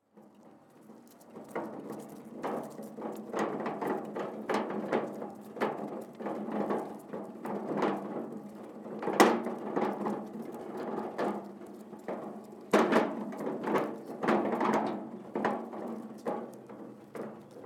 Wheelbarrow

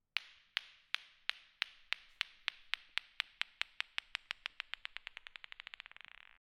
Snooker Ball Bouncing On Tile Floor

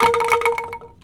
A 4 Bandung Angklung Vib

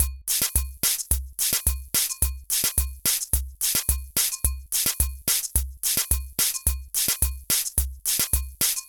Mixed Percussion

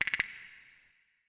Castanets 4 Fast Clicks

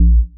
Sub Bass Stab

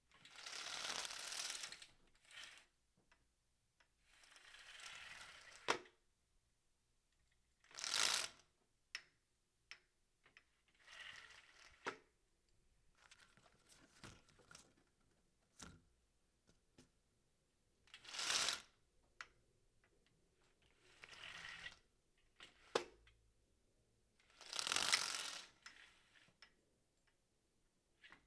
Opening Closing Blinds

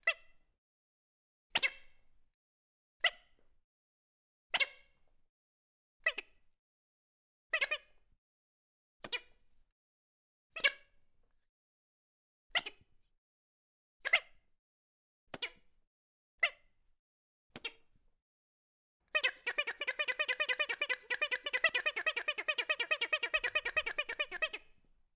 Quacky Duck Toy

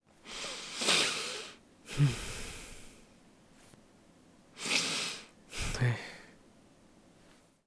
Sigh Man Voice Breath In Nose Breath Out Mouth

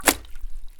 Dropping Gloves Into A Bucket Of Water

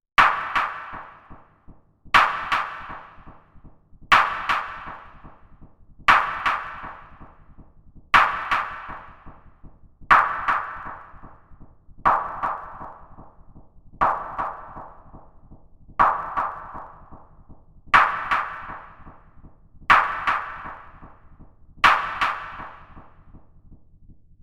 Snare Sizzle

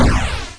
Explosion

